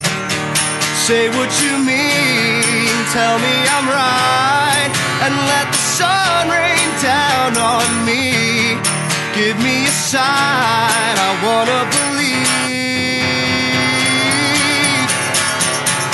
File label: acoustic